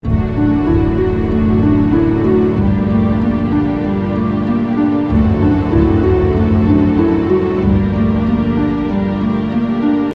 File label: Elevator (Early Version 2); Toontown: Corporate Clash Soundtrack; Cogs